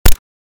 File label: Suppressed pistol single shot; guns